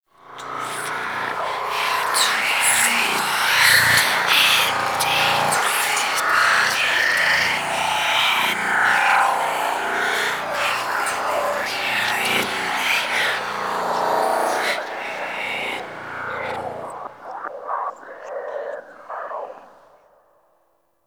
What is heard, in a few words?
Whispers High Pitch; Warframe; Ambience; Entrati